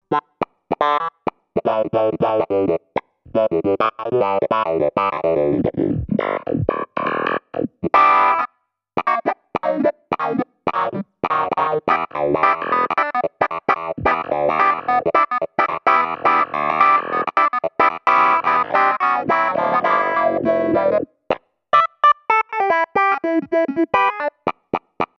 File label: clavinet